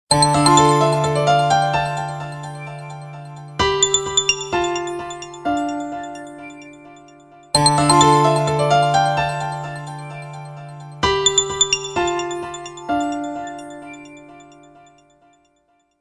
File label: Diamondring Htc